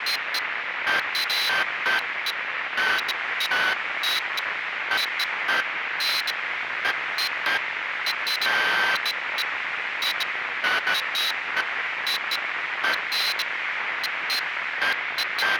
broken machine noise synth loop

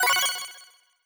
button brilliant low

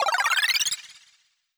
heal long

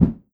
swish

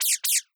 mouse squeak synth high

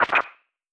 button filtered noise ok

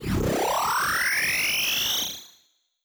strengthen

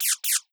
mouse squeak synth low

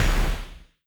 robot footstep short

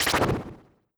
poison magic

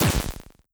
enemy die middle

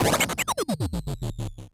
waza hit lowbit slow

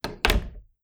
door small room close near

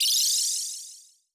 heal high

